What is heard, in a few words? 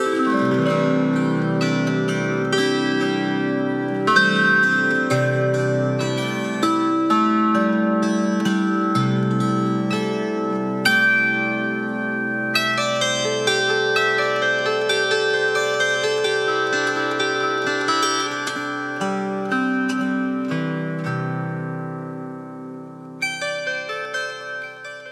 ringtone guitar; Mudita Pure